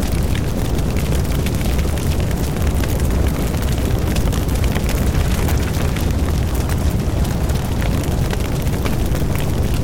Fire Loop; gamerip; Mars Sortie

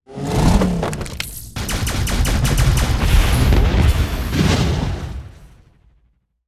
Piercong Shot

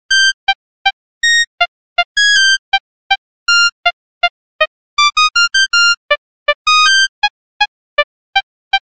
Ring Tone (Mono); Smeshariki Kaleidoscope of Games

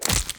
Death

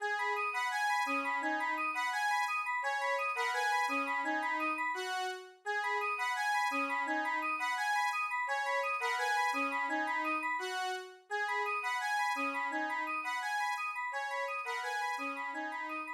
Station Jingles; Handyman

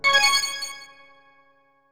Silver Coin

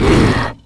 Scarky Hit

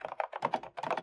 Friend-Typing; Sx Intro Friend-Typing 04

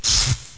Scorpion Attack

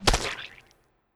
Mushroom Splat; Mushroom Splat B2

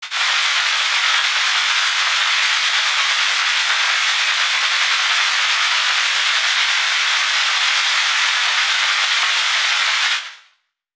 Signal noise

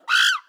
Monkey; Rabbids Multiverse

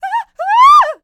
Enemy Generic Human Death Eaten

